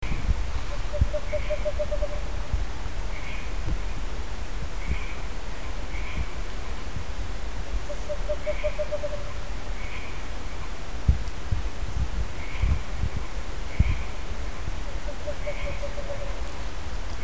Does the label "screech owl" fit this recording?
Yes